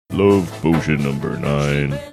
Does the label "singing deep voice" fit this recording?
Yes